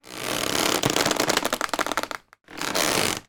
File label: chair wicker movement lean back squeak creak